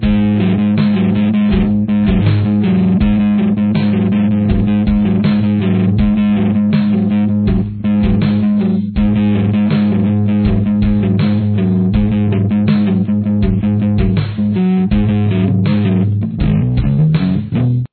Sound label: start stop bass